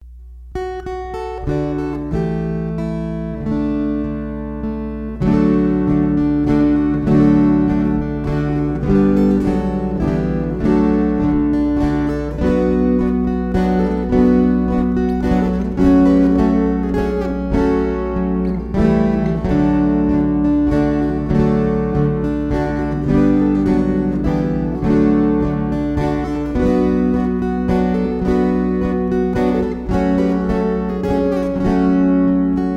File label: Waltzes